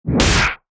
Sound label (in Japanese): 槍 通常攻撃